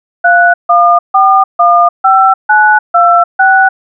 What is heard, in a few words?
secret call; ikerlan ctf; dialer mystery